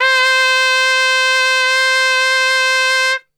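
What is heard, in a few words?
C 3 piccolo trumpet, Brass; Best Service; Brass Super Section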